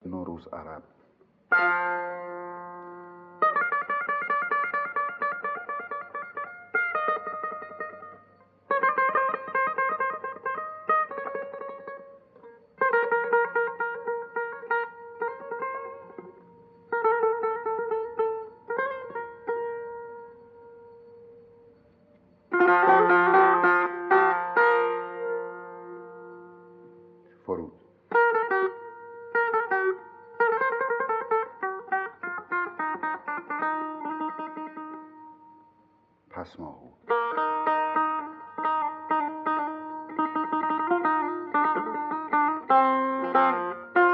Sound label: Noruz Arab Mahur, Dastgâh Mahur